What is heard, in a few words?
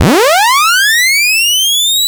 C64 Triangle Rocket; 8-Bit; Chiptune; Commodore 64; FX